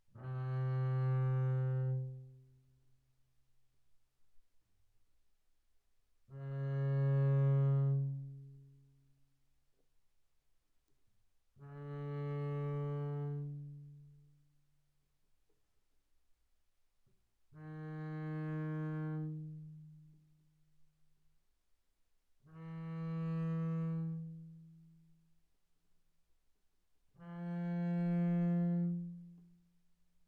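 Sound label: Bass arco sul G mezzo forte C3 B3, double bass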